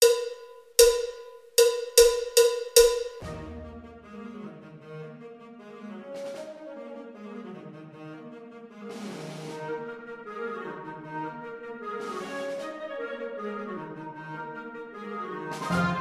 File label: Spanish Dance